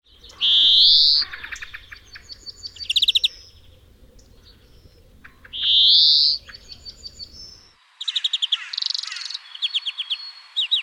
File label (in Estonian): rohevint; spring; Обыкновенная зеленушка